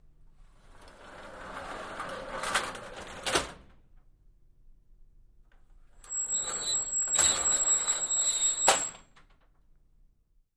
Door Accordion Sliding Metal; Single; Open And Close 3x, Slow, Medium And Fast; Metal Gate